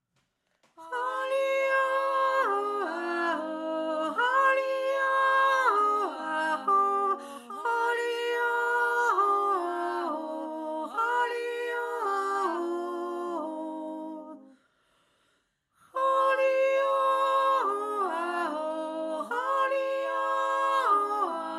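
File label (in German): Jodler, hohe Stimme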